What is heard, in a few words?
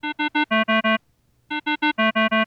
CVS Emerg, alarms